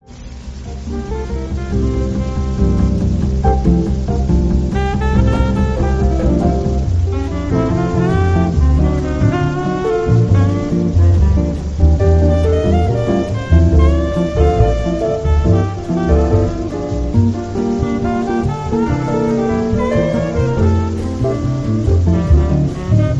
hold music

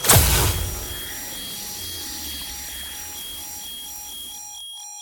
biotic grenade no healing sound; ana

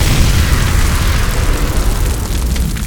giant deathlaser impact

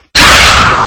xenomorph pain

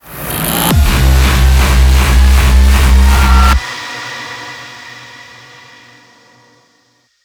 cultist base amb

